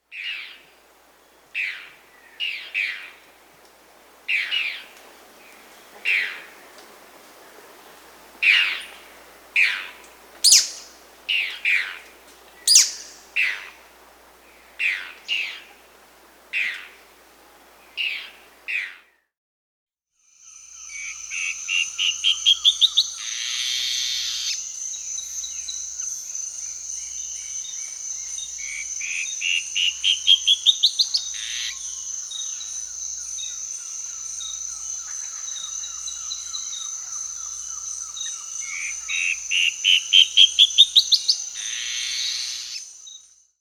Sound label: Dusky-throated Antshrike (Thamnomanes ardesiacus), Peru; S. America